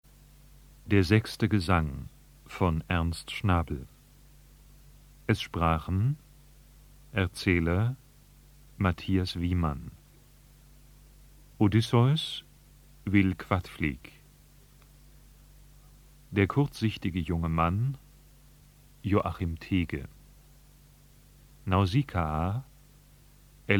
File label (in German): Gesang; Ernst Schnabel